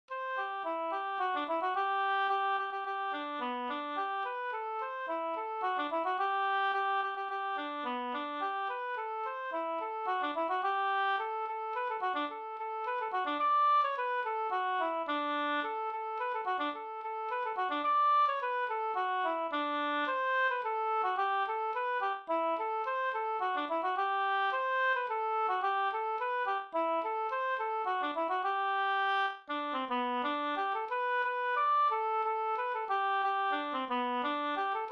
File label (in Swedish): folkdans, Kontra; Lollands